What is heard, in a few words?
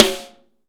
snare dry; Real Mega Drums